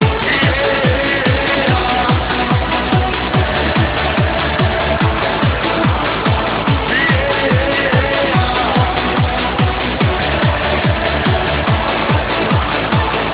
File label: bbox